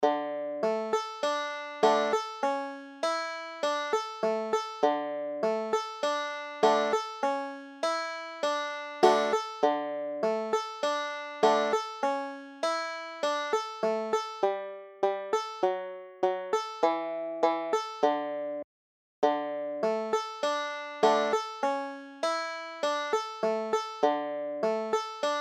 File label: banjo